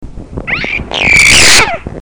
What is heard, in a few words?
shreek